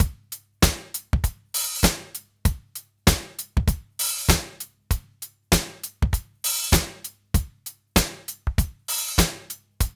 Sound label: DRUMS; East West; Funky Ass Loops